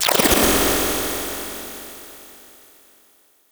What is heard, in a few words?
Spinback; Zero-G; Soundclash Nu Dance Electro; Acidized Wavs; FX Atmospheres